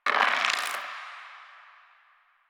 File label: Roll Dice; Warframe; UI; Cetus Vendors